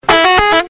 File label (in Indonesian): Klakson